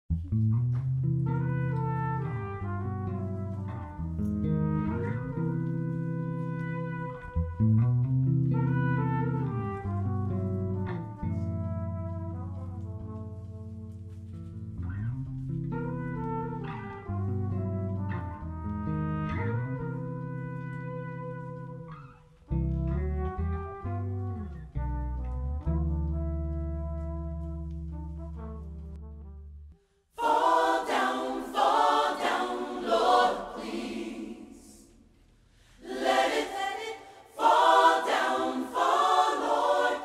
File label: Choir Rain